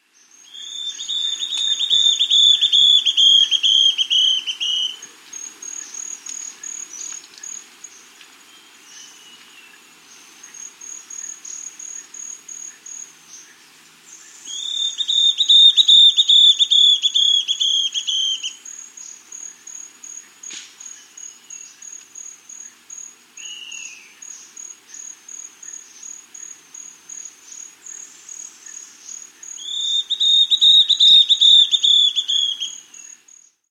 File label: Spotted Antbird; S. America